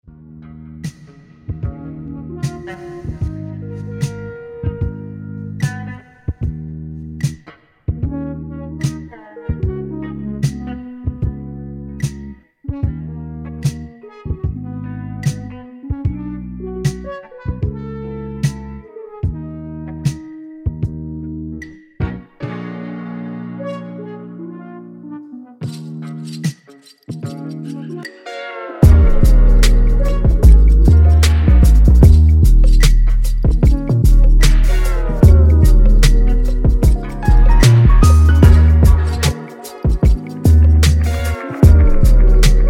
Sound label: 150BPM Neo Trap